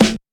sharp steel snare drum sound a key, snare tuned to the A note